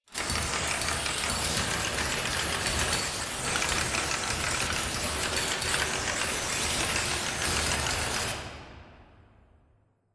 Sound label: Dome Power On Screens Clicking; Titanfall 2; Boomtown